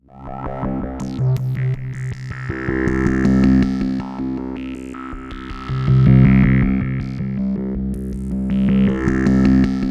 PULSE BASSES; Zero G; Malice In Wonderland

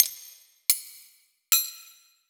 Kitchen Foley Sounds & Loops; Antidote

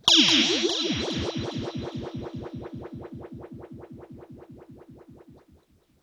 RIPPLES L, Funk Guitar; 90 s Sample CDs; Zero G

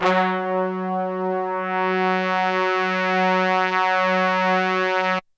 HORNS F#2 L

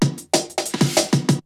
BREAKBEATS, TECHNO

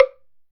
sfx perc

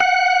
STOPS, ORGAN; InVision Interactive; Keith Emerson; ORGAN SPLIT

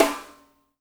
FUNK SNR; Spectrasonics - Burning Grooves CD2

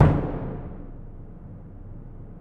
BD m Hit Loop; Multisamples; Digital Sound Factory Virtuoso

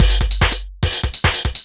Beat, Drums & Percussion; Electrocution; Beats

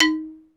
XYLO; STANDARD PRC

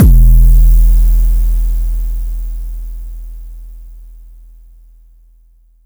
boom, Kicks; ModelCycles

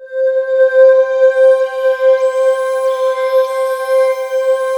WS NL C 5-L, Korg Wavestation; Back In Time Records; Korg Universe VOL-1

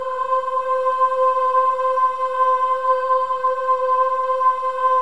BOYS AHS, Symphony of Voices

